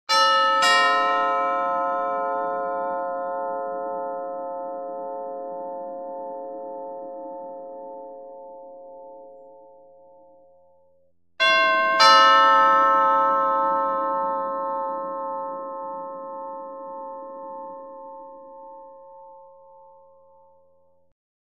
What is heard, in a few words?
Doorbell; Household Doorbell